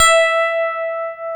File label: PIA DX E-PIANOS